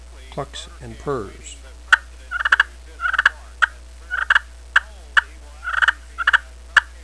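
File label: clucks purrs, turkey call; primos battey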